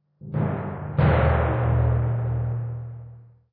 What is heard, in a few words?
Tymp Hits